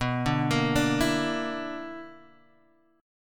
B-Minor Major 7th Double Flat 5th B down Guitar Drop D